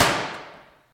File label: shiny snare sample g key, Royality free acoustic snare sample tuned to the G note.; snare drum samples